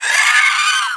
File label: floaty baby roar